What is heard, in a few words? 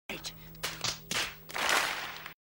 epic facepalm